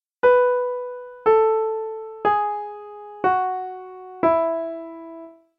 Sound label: DOWN Treble E maj